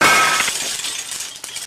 glass shatter

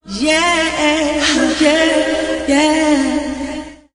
yeah yeah yeah yeah sung; ooh ahh

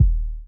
roped, Kicks

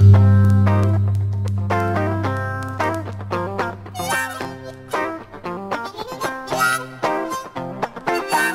guitar, loops; Phonky

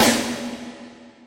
snare, Real

